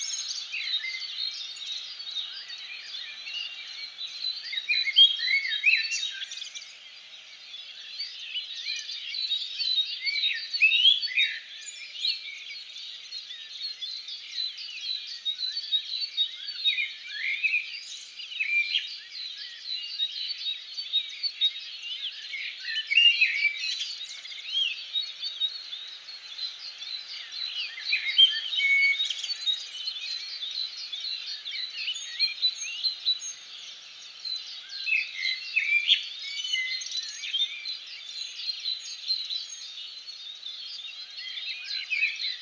atmosphere birds

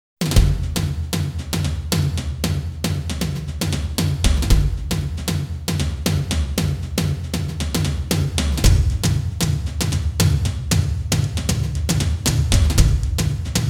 instrumental version

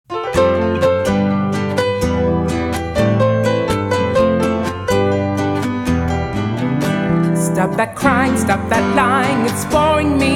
acoustic version

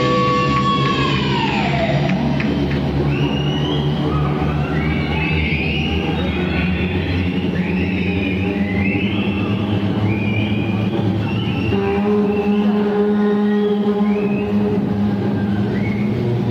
bass solo

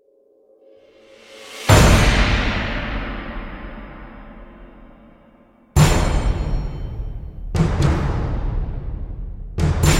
drums

